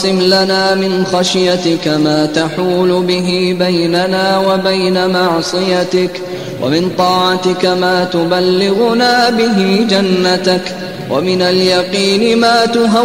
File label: Amazing Voice Tilawat Ringtone